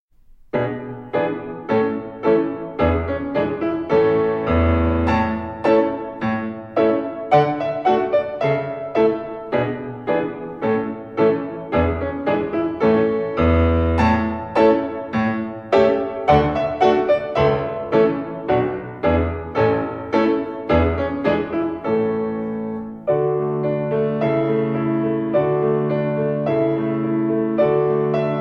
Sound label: Performance Piano